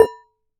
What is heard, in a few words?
Damped Hit, Glass, Large Wine Glass